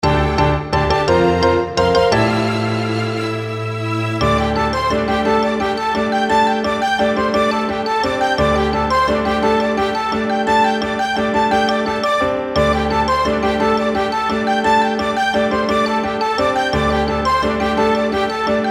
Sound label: bgm